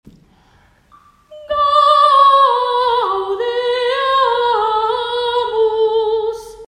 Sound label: Gaudeamus Sop ten; citysings